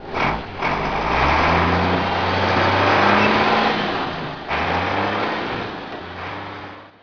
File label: truck by; SpecOps2 MPlayer Demo